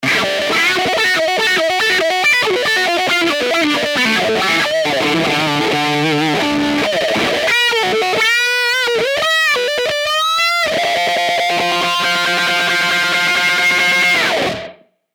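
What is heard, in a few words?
SOLO, Guitar Rig; GR5 Presets – Rope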